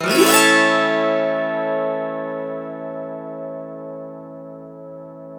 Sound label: AUTO HARP